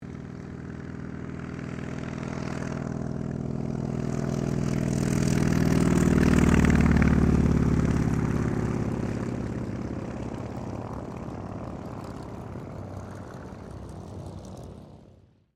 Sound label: AIRCRAFT CAC WIRRAWAY TAKEOFF; CA-16 Wirraway; Airplanes (Sound Effects:Vehicles)